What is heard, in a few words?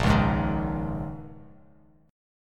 Bb Minor 11th Bb down Bass Standard; strummed; JGuitar